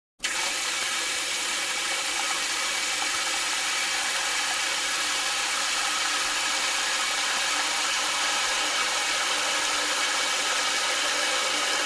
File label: Turn on Faucet Run Water Into Sink & Fill Up w Turn Off, Household